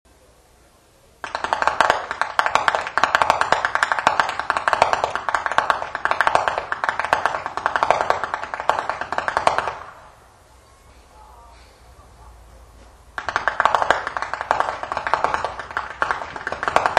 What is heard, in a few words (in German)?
schnalzen, flachgau; bergheim